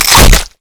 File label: trollsmash oh; trundle; LoL